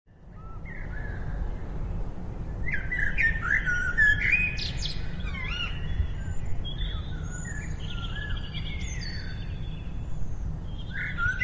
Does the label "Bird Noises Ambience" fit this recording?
Yes